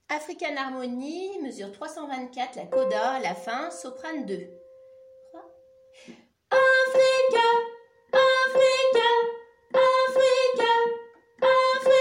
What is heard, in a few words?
African mes soprano